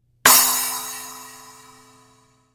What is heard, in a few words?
S Splash Crash, cymbal